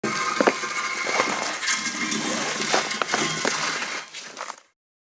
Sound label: Snake slithering movement metal surface